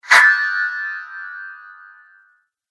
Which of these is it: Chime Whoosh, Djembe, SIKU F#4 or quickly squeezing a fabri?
Chime Whoosh